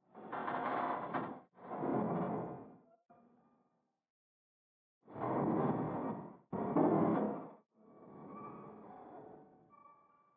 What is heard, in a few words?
Metal Creak